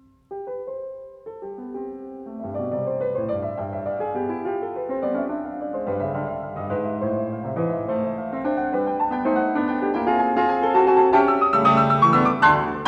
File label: Un poco piu vivace, C major, piano; Beethoven; Diabelli Variations in C major, op.120; Brilliant Complete Beethoven Edition